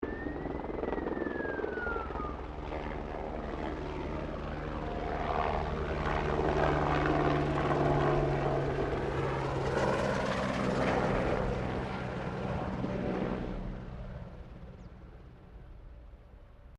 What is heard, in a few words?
helicopter Bell 204 firefighter bombing run medium; Helicopters; Aircraft Collection